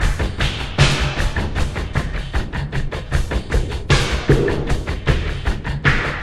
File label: Lake beat